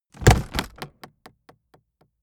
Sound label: aluminium case hit hard